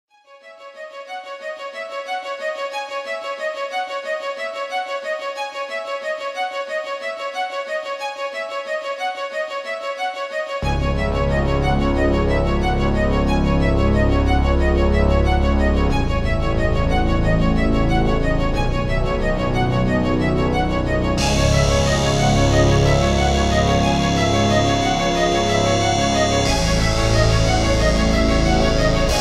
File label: hard aggressive diss rap instrumental